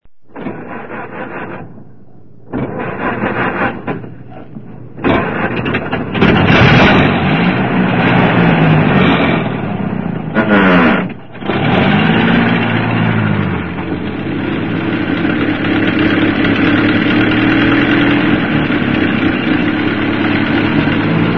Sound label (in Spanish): AUTO; Sonidos/transporte; Autos 1